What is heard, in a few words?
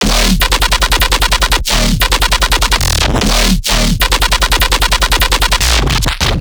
Bass Shot D# 150, Dubstep Basses; Loops; Pyro; GhostHack; Advent Calendar 2021